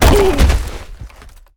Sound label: Football Hit Tackle